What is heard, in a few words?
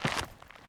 ash step; Golden Crayon Sounds